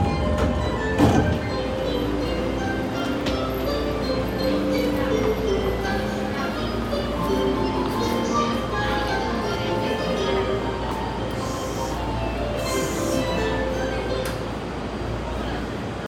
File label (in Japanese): JR湘南新宿線戸塚北